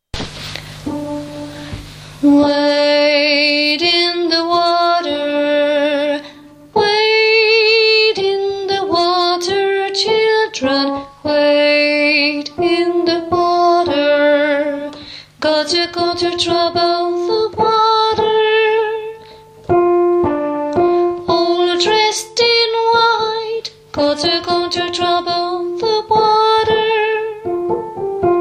Wade in a water alto

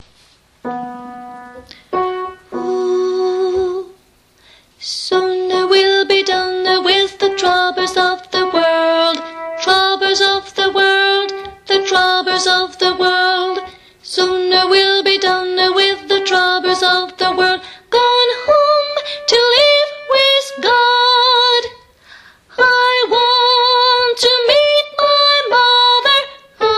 soon awill be done tenor

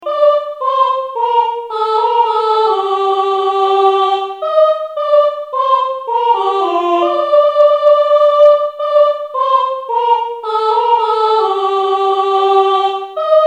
fanfare soprani; (midi); Fanfare du printemps 1

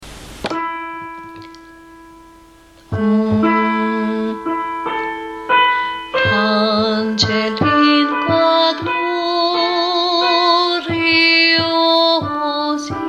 pange lingua alto

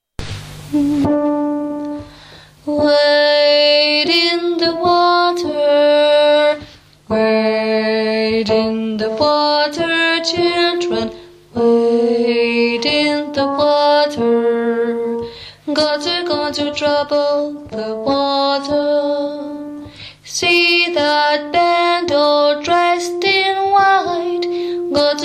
wade in a water fin tenor

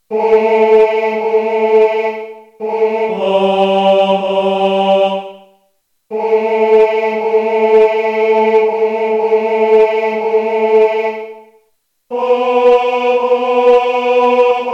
lascia tenor; Lascia ch'io pianga (voix synthétique)

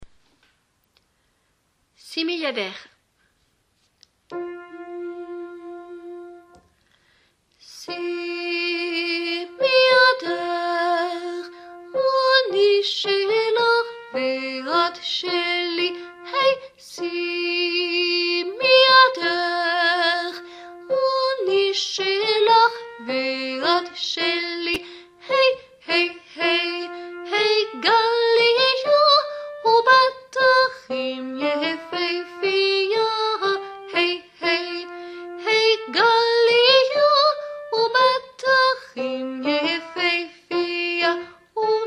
simi yadech, tenor

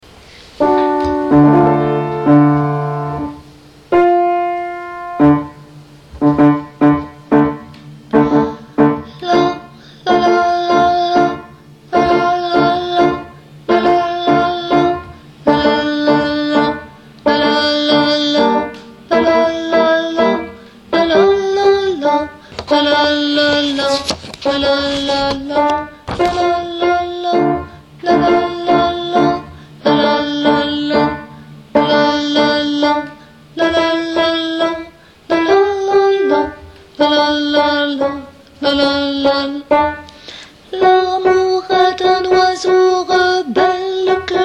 habanera alto; Habanera (voix)

